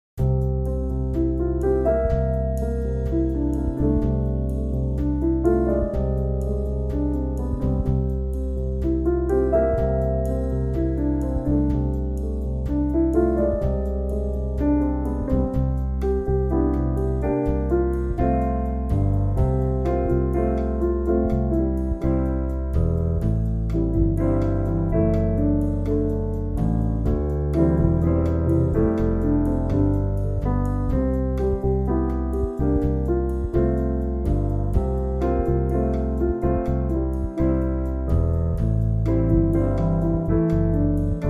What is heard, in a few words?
With Trio